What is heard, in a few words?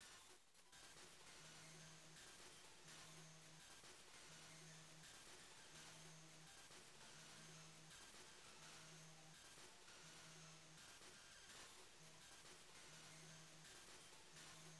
Slap Ballad; SootSound; MSX2